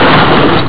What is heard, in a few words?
Car world fast